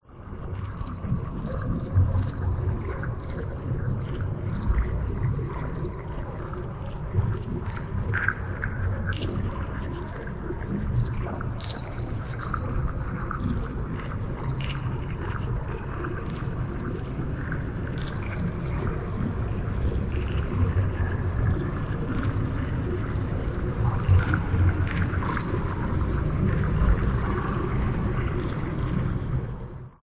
Moving Crumble Trickling Eerie Drone Ghostly Flowing; Underwater; Otherworld; Decisions; lukas tvrdon